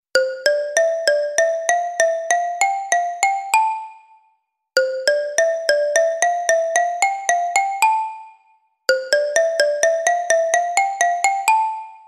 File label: Accelerating Xylo